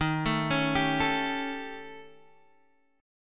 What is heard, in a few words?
Eb 7th Flat 5th Eb down Guitar Standard; Eb7b5 arpeggiated; JGuitar